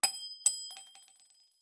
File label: shells 20mm bolt; tanks; War Thunder